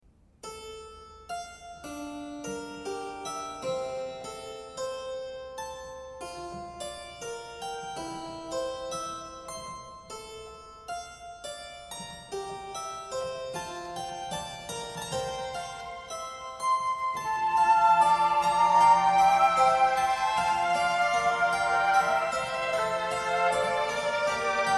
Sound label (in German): Fantasie in d fuer Cembalo, Streicher, Floete und Oboe; Instrumentalkompositionen